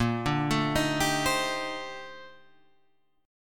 A sharp Major 13th A sharp

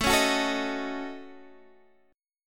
A 6th Flat 5th, Guitar Standard, chord; strummed; JGuitar; x,12,11,11,10,11

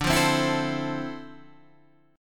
D Minor 9th

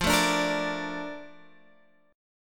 Bb Major 7th, F

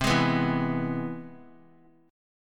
C Augmented Major 7th; strummed; JGuitar

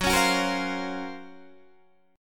Gb Major Sharp 11th, Guitar Standard; GbM#11 strummed

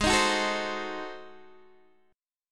Ab 7th Flat 9th down Guitar Open D

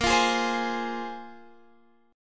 Bb 7th Suspended 2nd, Guitar, Standard; x,13,15,13,13,16; Bb7sus2 strummed; JGuitar

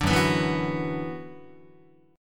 F sharp 7th Suspended 4th Sharp 5th, Guitar; F#7sus4#5 strummed

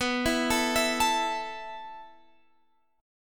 A 5th B, guitar chord; A5/B; arpeggiated

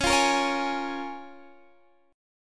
C sharp 6th C sharp down Guitar DADGAD

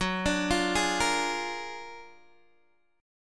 F sharp Augmented Major 9th F sharp Guitar DADGAD

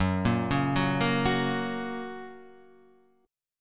Gb Major, Guitar Half Step Down

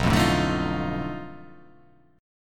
B 7th Sharp 9th Flat 5th, down

